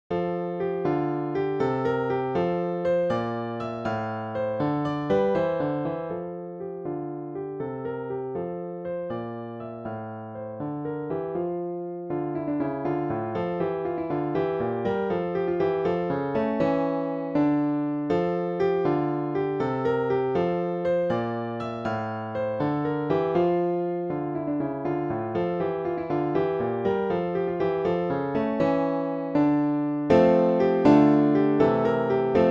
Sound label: piano